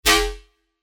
Synth Shot F#; synth shots tech house; Bumper; revealed

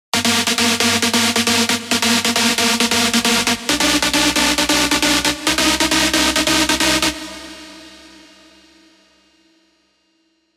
Stab; Stab Pump Up; Revealed Serum 2 Big Room Techno Drops Vol. 1

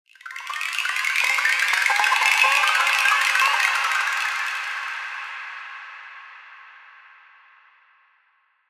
PyroMaxxer, Sylenth1, fireworks; alonso fireworks stand 1776 edition

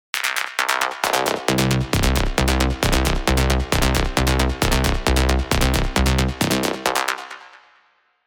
rolling bass; Disco Weapon; Revealed Serum 2 Rolling Bass Vol. 2

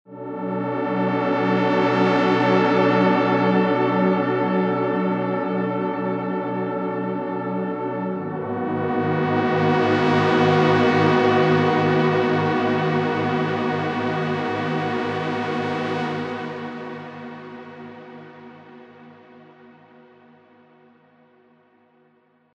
Dramatic Chord; revealed diva techno pads vol 2

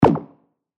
percussion; revealed percussion vol 3

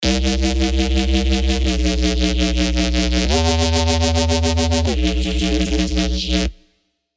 Rhythm Warfare, hard bass; revealed serum 2 hard bass reactor

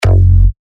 F#, bass shots; Type; revealed bass shots vol 3

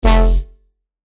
Bass Shot F; synth shots tech house; Adore; revealed

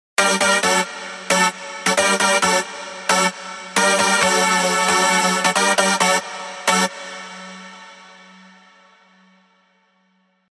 rave stabs; Pure Excess; revealed spire rave stabs

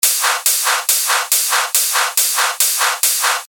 140 BPM, techno loops; Thokk; Revealed Techno Top Loops Vol. 9

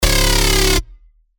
bass shot C#; Overlord; revealed bass shots vol 4

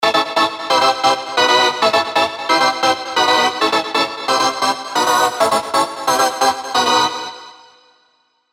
First Pitch, rave stabs; revealed; Spire

